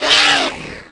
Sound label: Small Spear Death; Small Spearleg